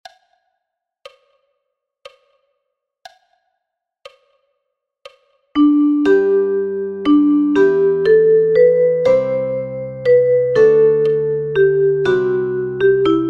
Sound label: Flatpicking, ukulele; Fanny Power; flat jigs